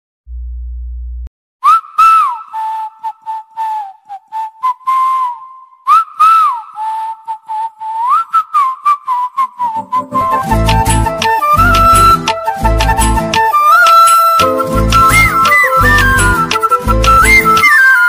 bgm ringtone; sr kalyana mandapam

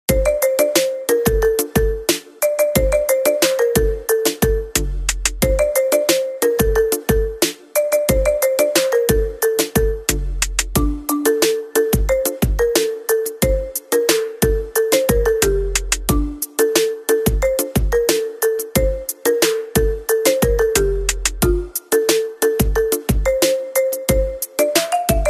instrumental bgm ringtone

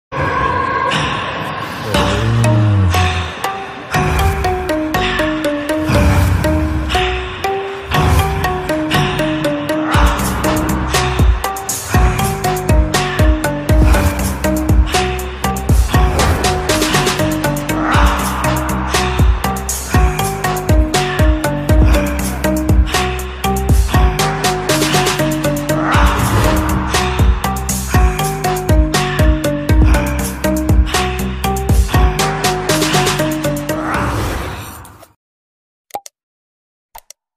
interval bang bgm; hd bgm; zombie reddy

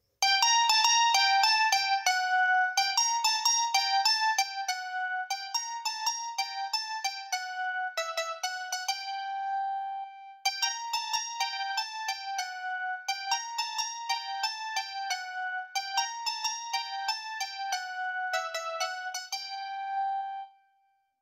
piano tutorial short ringtone; shashi

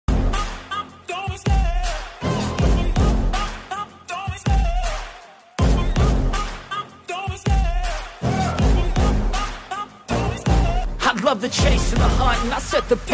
english ringtone; free fire background song

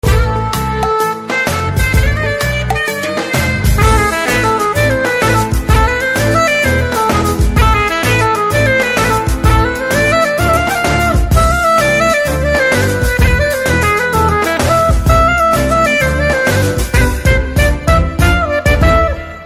Piano Tone; Vunnadi Okate Zindagi; Telugu

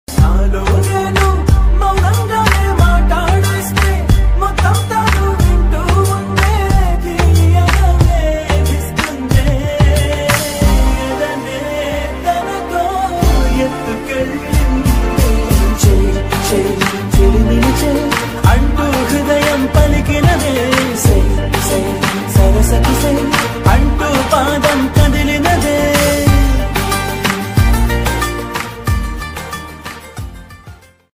love bgm; awara movie; telugu

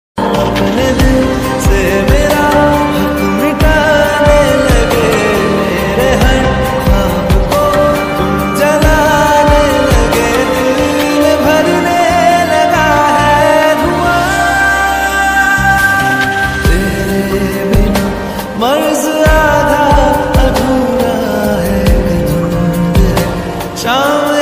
sad ringtone

